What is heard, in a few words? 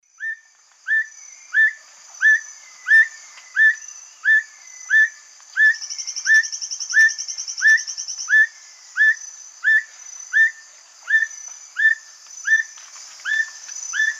Neoctantes niger, song; S. America; Eastern Lowlands